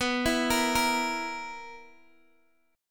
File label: B Major 7th Suspended 4th, B, down, Guitar Open D